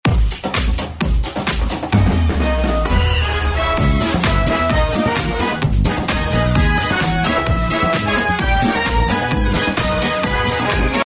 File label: ringtone